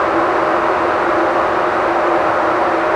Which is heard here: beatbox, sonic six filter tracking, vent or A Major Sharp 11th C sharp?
vent